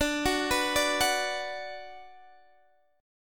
D 6th D down Guitar Open D, chord

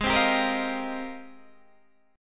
G sharp Major 13th, Guitar, DADGAD

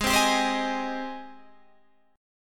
G sharp Major 7th G sharp

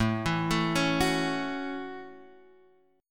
A Major Add 13th A, chord; Aadd13 Chord {X 0 2 2 2 2} arpeggiated

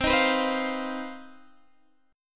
C Minor Major 11th C down Guitar Half Step Down; CmM11

